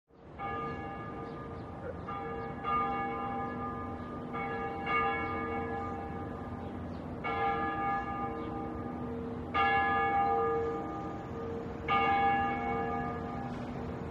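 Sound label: City Church Bells Distant; bell towers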